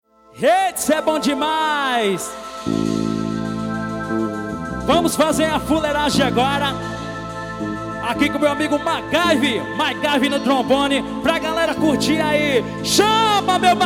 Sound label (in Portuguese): forro